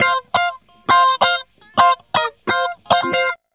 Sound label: GUITARS 135, House